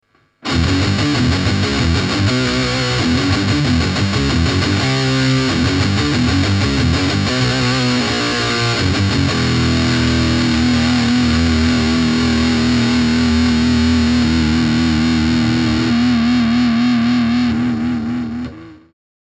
extreme + oct; Cube 40XL

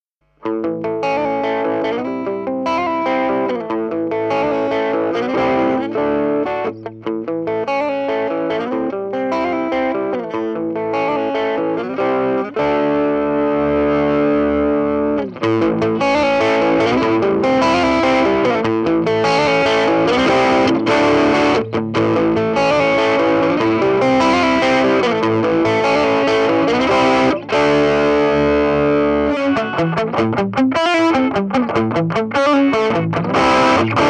drive; Engl Gig Master 15; Lead; Clean

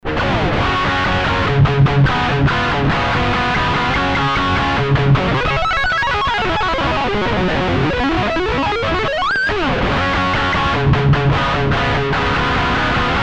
rocktron utopia g200

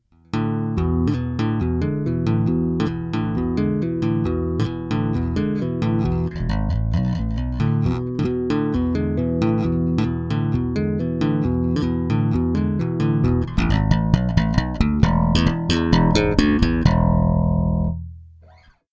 taping

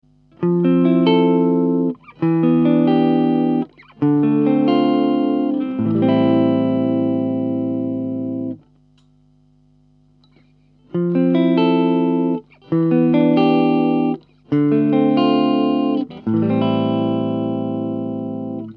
clean; WASHBURN RX22F-BLB

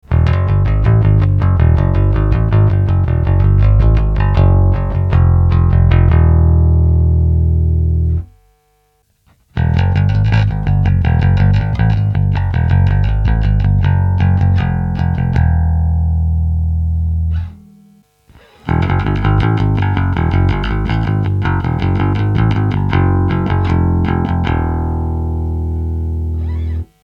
picks; Jazz Bass; MusicMax

